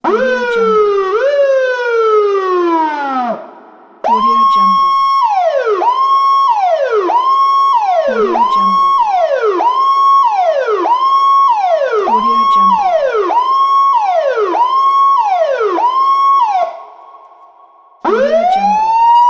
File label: a police siren; impulssound